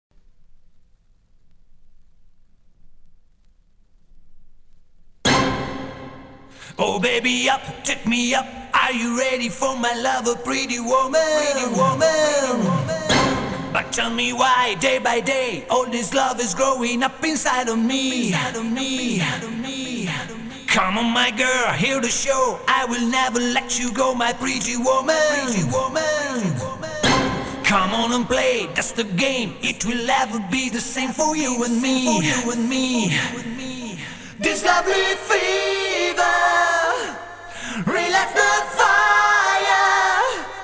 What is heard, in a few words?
Acapella